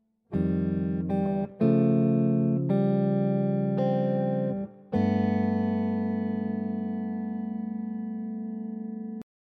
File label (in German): Reharmonisation Halb Wild; gitarrenunterricht